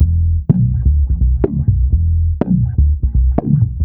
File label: IN HOUSE, E, 124 BPM, Bass; Sampleheads; Ultra Freakin' Bass